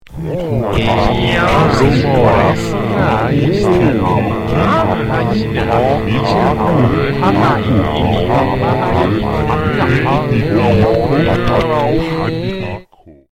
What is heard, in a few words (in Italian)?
rumore; test udito